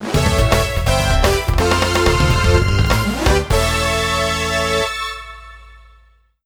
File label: Target - High Score; Super Monkey Ball 2